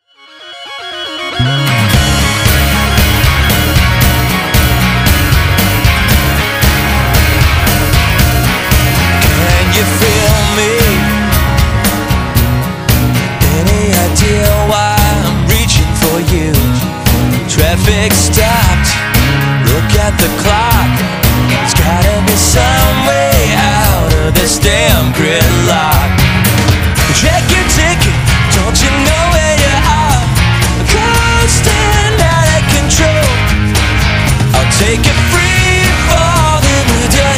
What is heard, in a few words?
Scream fade